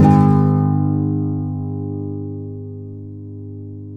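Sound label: nylon down major A; FLAM CHORDS; Guitars; Spectrasonics; Hans Zimmer Guitars VOL-2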